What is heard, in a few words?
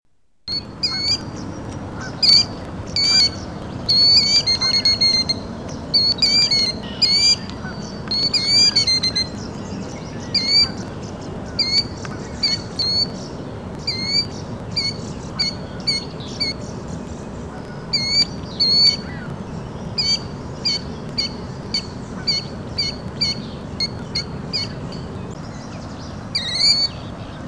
killdeer, Plovers; Birds